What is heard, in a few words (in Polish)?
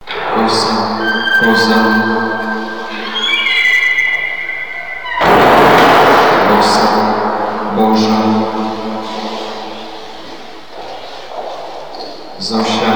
kosciol przy drzwiach brak petli indukcyjnej